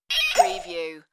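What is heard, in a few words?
Funny Toy Sound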